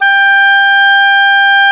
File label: OBOE, Orchestral Winds, Oboe mf f